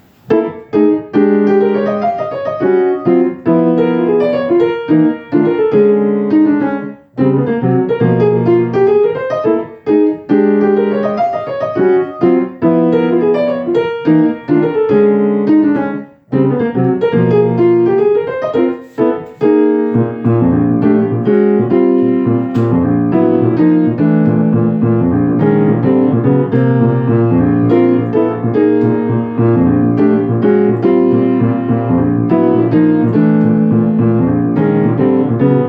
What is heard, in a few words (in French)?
Melodie danse en Bb